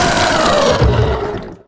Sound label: Skeleton die